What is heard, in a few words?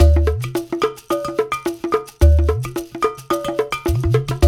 UDU, Udu Bata; Spectrasonics; Supreme Beats